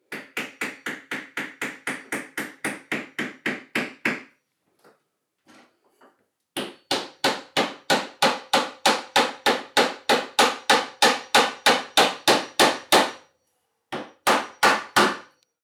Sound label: Hammering Indoors